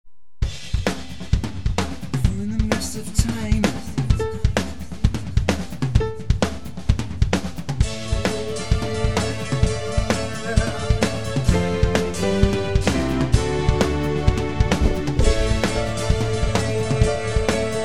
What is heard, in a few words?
instrumental; creative torbay